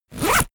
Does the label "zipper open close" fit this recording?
Yes